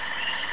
cricket

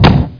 cat; CD Action

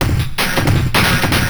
DRUMLOOP D+B 160, Drum+Bass Loop; eJay

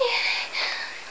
MOAN

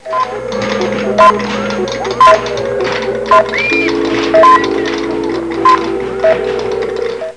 computer; PUZMANIA

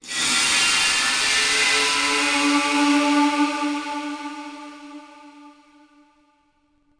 ice; DAoC; Toa; Gamestar

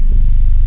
soft, drums, bass; da capo vol1

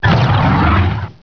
axe

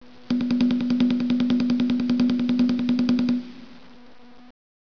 rhythm

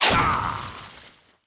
SHIP HIT; PHARAOH

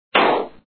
bubble Die Sound; nexon

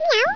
meow; CHAINLNK; 501 Great Games - Volume One; Guildhall Leisure Services

